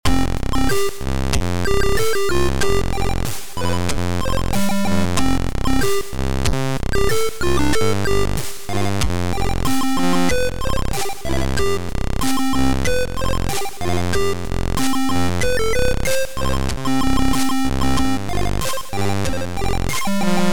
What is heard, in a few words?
Ending; SuperHair Ending; JumpError